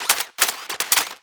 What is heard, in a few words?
Gun mech Reload